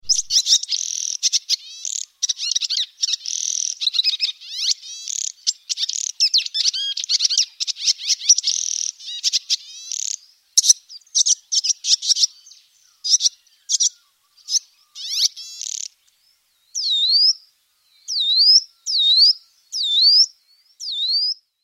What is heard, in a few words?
swallow welcome, bird calls